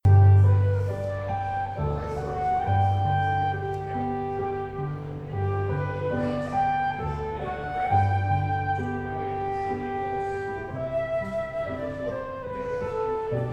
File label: Menuet flute piano ceremony music live; Bach